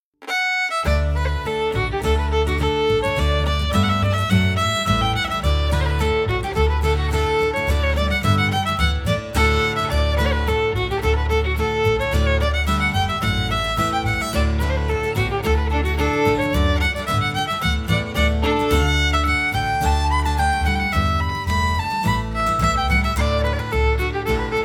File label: Recessional or popular event background music, fiddler